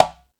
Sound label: Latin Layers, drum machine